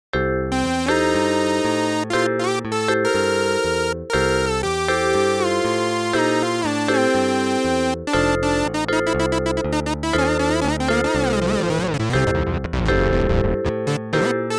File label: MIDI blues; spellman studio